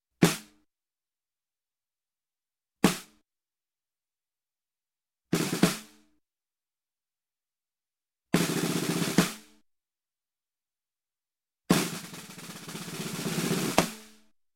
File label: Snare Drum single Hit, Music, Percussion; Sound Ideas; Series 2000